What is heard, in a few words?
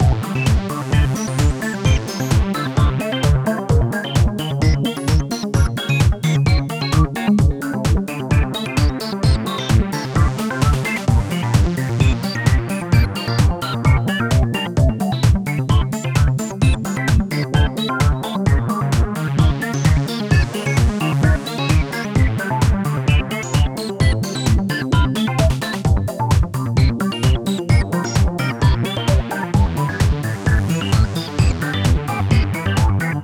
Digital Grunge; sci-fi